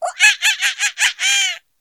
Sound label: Cartoon monkey mocking laugh; khi